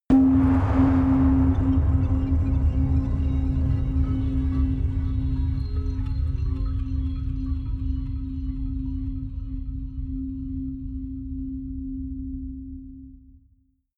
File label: atmospheric artifacts long, impacts; hits explosionen